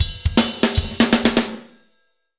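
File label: fast shuffle, Roland drum beats; Northstar - Drumscapes Roland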